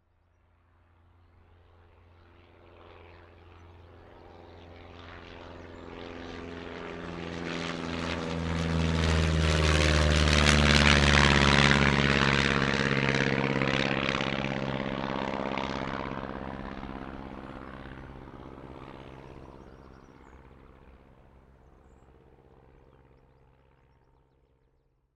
transport aircraft airplane light prop pass overhead take off